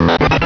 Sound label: jelly